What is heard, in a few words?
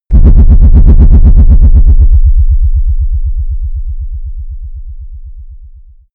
wobble distortion